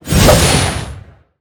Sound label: elf staff